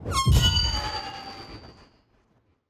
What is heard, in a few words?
Enchanter Magic